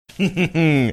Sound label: chuckling